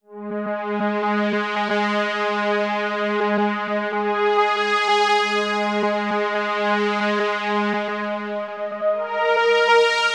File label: Tek Synth Lush Pads